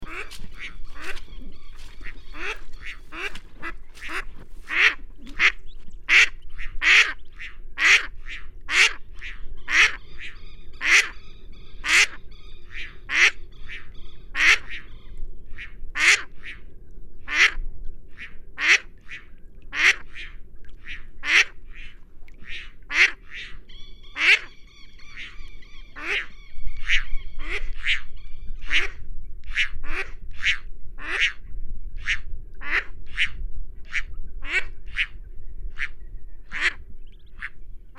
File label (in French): canard huppe